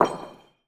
reel bounce

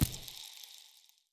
bonus hover; netent

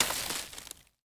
spin Button Click; netent

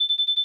extra Free Spins Loop; netent

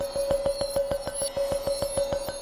bonus Cell Selection; netent

